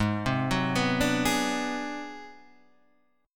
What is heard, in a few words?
Ab 7th Sharp 9th Flat 5th Guitar Standard, chord; Ab7#9b5 arpeggiated; JGuitar